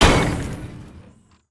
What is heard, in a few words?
Generic Complete